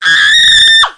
scream; SINDEMO; civfem

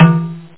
cowbell; percussion

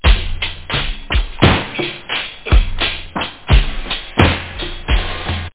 beat, house loop; da capo vol1

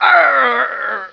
die; OutLaws

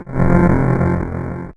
Noise; aloneinthedark4

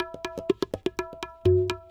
PERCLOOP ETHNO 125; eJay

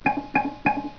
Menu Popup; Desktop Themes 2000; Fest; Charlie Brown Christmas

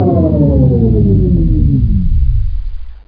Poweroff

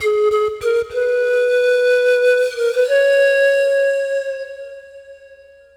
flute, ethno; eJay

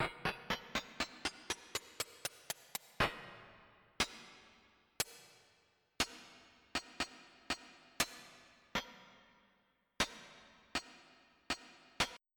sword; Populous Demo

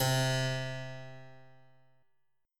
swipe

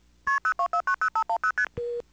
call Start

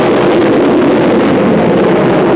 plane; Barrage

